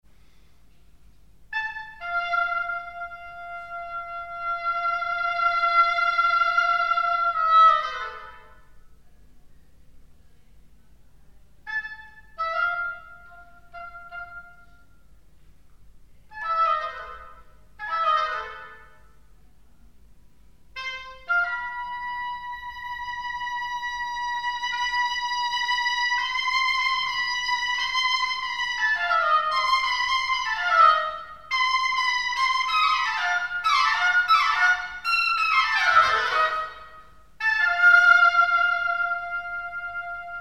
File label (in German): Oboe und Viola; Drei Stuecke fuer Oboe und Viola, Nr 1 (Anfang)